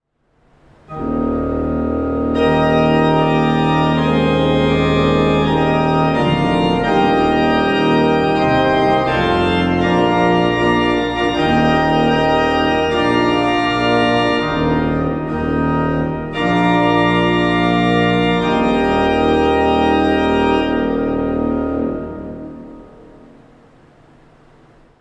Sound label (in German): Orgel, pfeifenorgeln; PED-Prinzipalbass 16'+Oktavbass 8'+Hohlflöte 4'+Posaune 16'